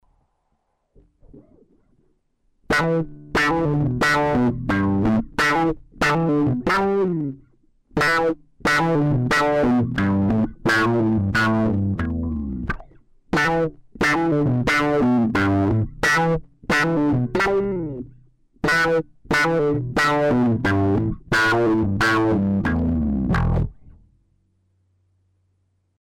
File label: wah; Snarling